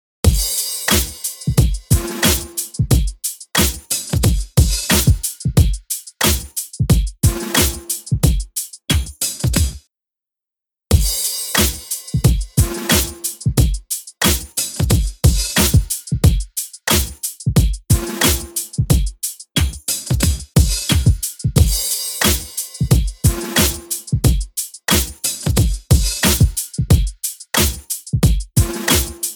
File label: Sax